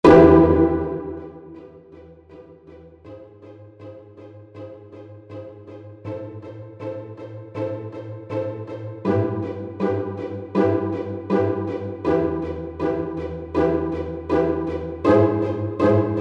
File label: String Ensemble; Native Instruments Symphony Series